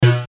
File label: button robotic; Multimedia; Buttons; Blastwave